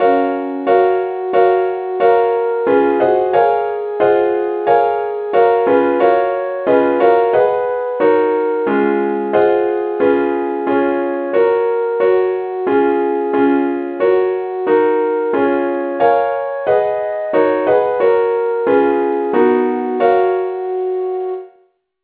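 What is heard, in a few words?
advanced lead key f sharp; saintthomas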